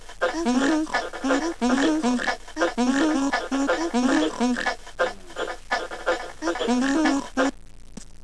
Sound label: Singing